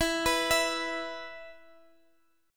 E 5th E; arpeggiated

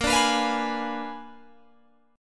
A sharp 7th Flat 9th A sharp down Guitar Open G

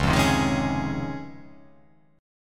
Db Major 9th; strummed; JGuitar

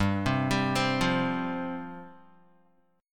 G 7th Suspended 4th G down Guitar; Listen to G7sus4 arpeggiated; G7sus4 Chord

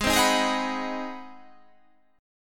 G sharp 7th Sharp 9th

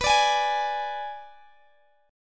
B-Minor 6th B down Guitar Open G

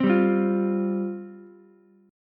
F Suspended 2nd F Ukulele; strummed; JGuitar

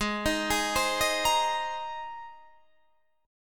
G sharp Major Add 9th, Guitar Standard; arpeggiated; 16,18,18,17,16,18

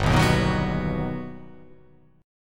G Augmented Major 7th

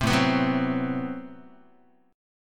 A Minor 6th Add 9th Guitar, chord; Am6add9 strummed; 3,5,2,4,4,x; JGuitar

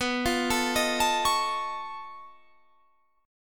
B 9th Flat 5th B down Guitar Standard; B9b5 arpeggiated; JGuitar

